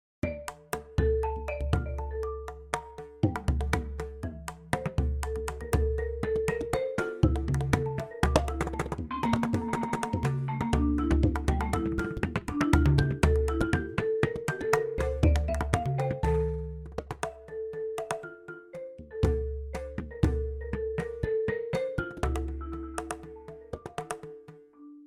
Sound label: marimba sketch